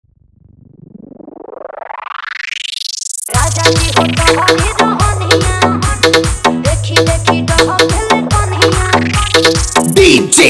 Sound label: Bhojpuri Remix Song